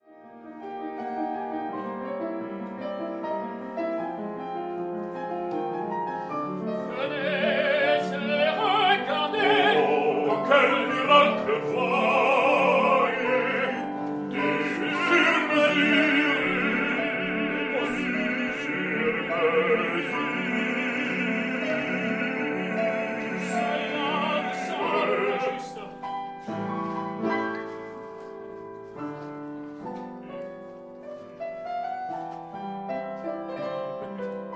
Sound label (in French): choeur final hommes